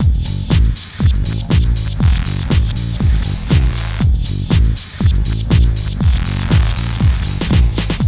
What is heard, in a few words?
GHET ROL